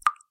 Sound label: Ticking Sound, notifications; Itel; S25 Ultra